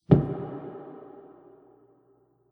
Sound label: Cave Impact Soft Thud; Miscellaneous Impact; Andrew Fleming